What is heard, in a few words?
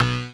SYN Two Sounds; Best Service Gigapack 1